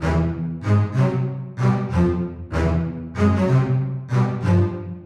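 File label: Double Basses 95-E, horrorscape samples 95bpm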